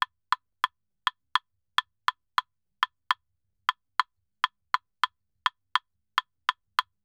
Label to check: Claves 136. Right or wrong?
Right